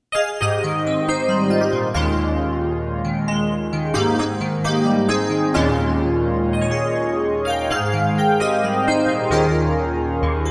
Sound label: Pop Rhodes; xp-80; roland; roland demo cd-3